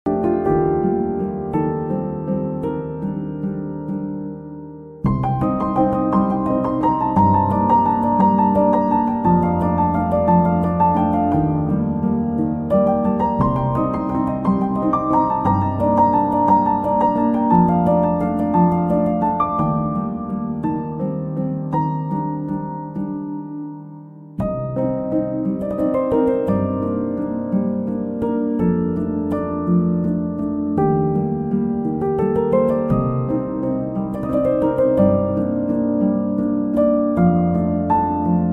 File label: Ringtone, پیانو